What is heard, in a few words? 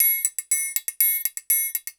120 TRIANG; Best Service Advanced Media Trax Modern Composer